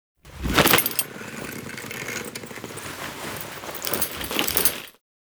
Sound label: first death foley; Enemies; Drifter; Warframe; Duviri; Dax